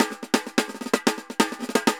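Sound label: TAMBOR SALSA, salsa drums and percussion; Best Service ProSamples vol.14; AKAI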